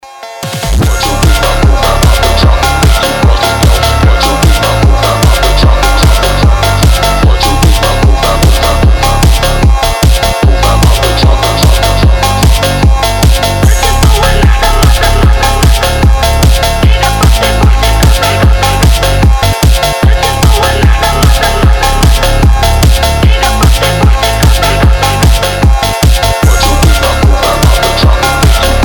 neon wave

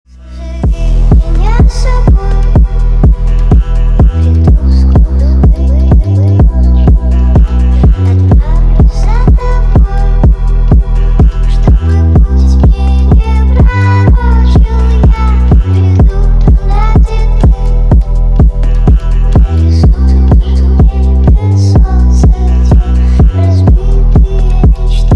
trap

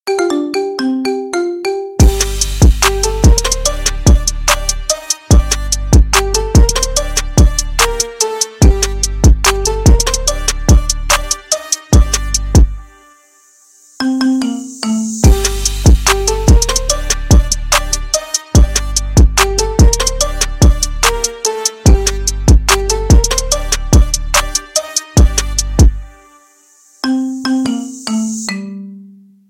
marimba remix

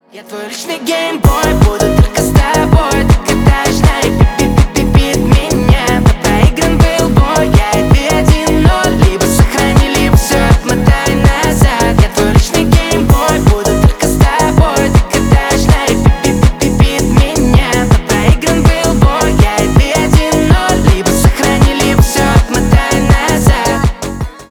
remix

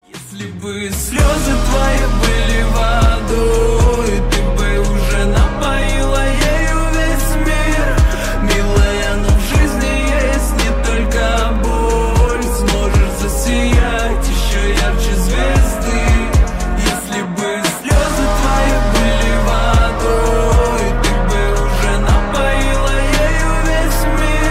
slowed reverb; ost

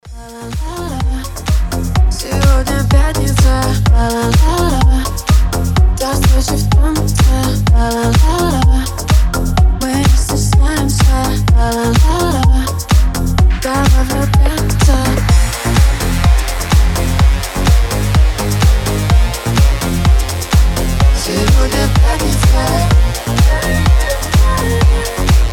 remix

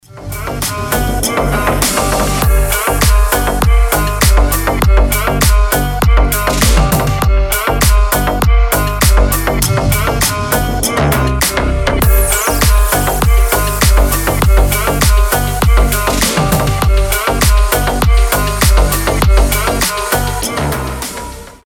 arabian ringtone; арабские

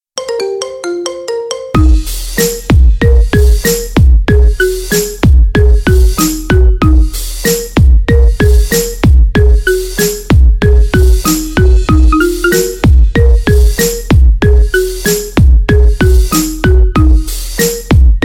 marimba remix; iphone

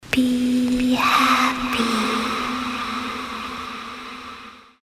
creepy happy; жуткие

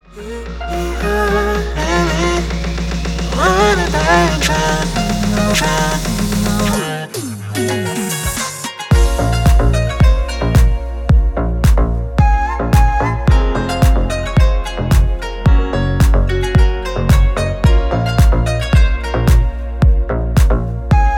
deep house

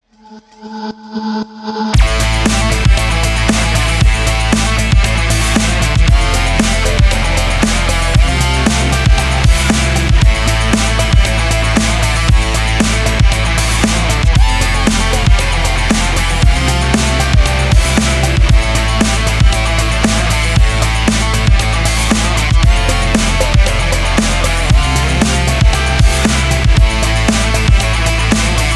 instrumental; рок

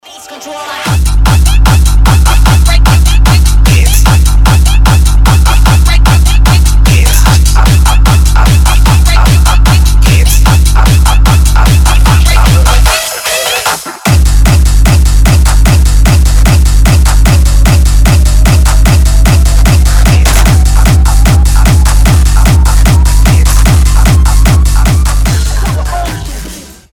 bass

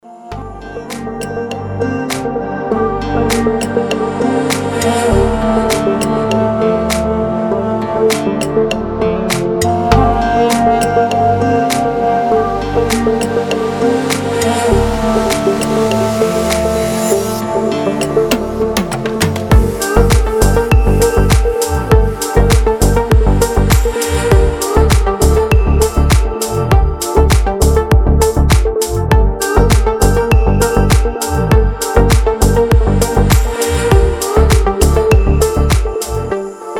arabian vibe, deep house

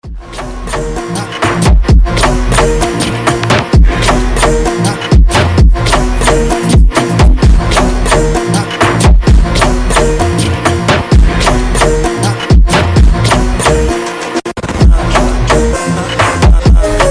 brazilian drift phonk

